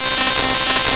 wiz strings; Epic Collection 3, The; Epic Marketing; muzak

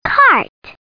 Cart

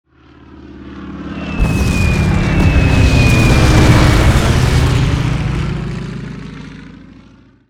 Plane Bombing; 1944 Battle Of The Bulge

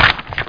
Book Page; Total Distortion

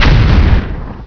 missile; Uprising

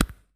rollover; Ultranium 3D

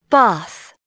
bath; Dorling Kindersley My First Incredible Amazing Dictionary

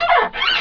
squeek; BESTSHOT; noisybit

